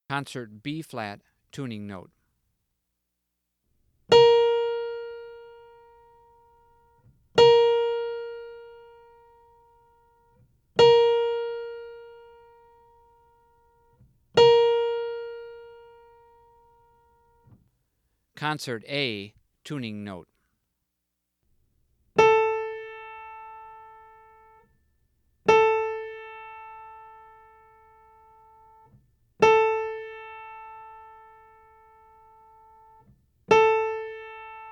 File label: Tuning Notes; James „Jamey“ Aebersold Play-Alongs